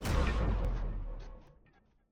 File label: wave Spawn; Mindustry; ui